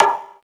Noggin, Classic SFX, cartoon; musicradar; cartoon caper

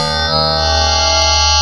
ALIEN TRANSMU; ENVIRN-AMB

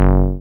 TB G2; Best Service Gigapack 1; SYNTB303

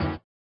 SWUSH; Spectrasonics; Metamorphosis

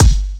Kik; Rawcutz - Nu Forms

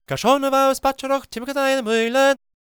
GAELIC, World Voices; ILIO; Vocal Planet

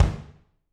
BD, Drums; Bob Clearmountain Drums I

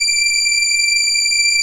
ELKA, String Machines; ELKA RHAP

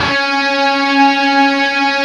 LEAD C#3; East West; Steve Stevens Guitar Samples Collection

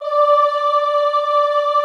OOH D4, Classical Choir; Best Service; Extended Classical Choir; OOH MIX

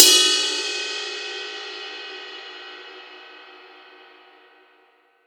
ride cup

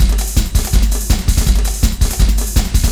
LOOP, Eurotech; Sounds Good

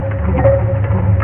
WEIRD AMBIEN; ENVIRN-AMB

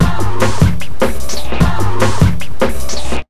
150, Big Beat Drumloops

